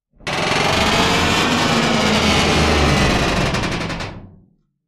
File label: door creak med, squeaks